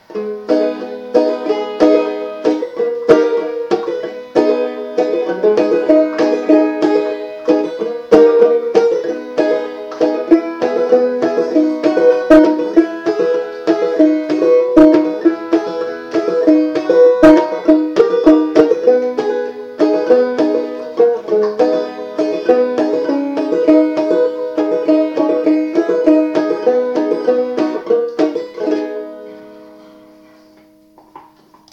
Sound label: cayenne banjo